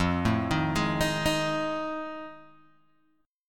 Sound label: F 7th Sharp 9th, Guitar, Open G